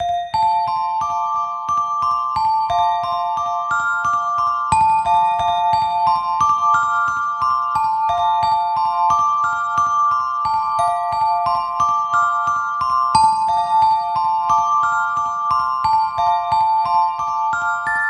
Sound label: BGM Snow; Happy Birthdays